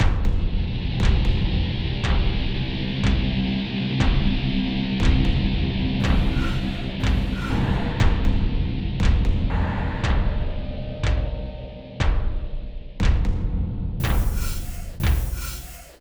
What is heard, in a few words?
Danger Tonal; Insanely Twisted Shadow Planet